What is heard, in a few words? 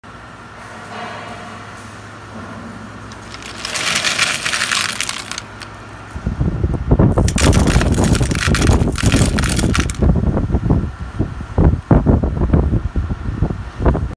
bolt and nut; پیچ و مهره